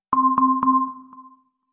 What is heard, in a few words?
avast threat detected sound effect